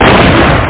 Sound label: Explode